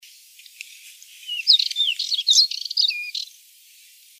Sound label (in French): fauvette a lunettes; flûté; Glissando Gazouillis; chant